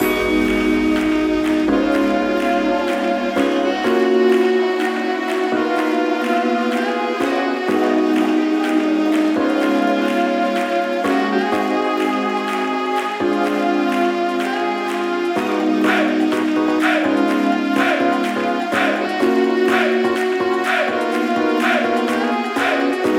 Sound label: Boss Sax; Pineapple Ocean; Popslinger